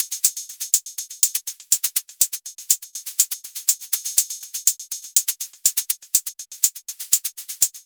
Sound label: 122 drum loop hats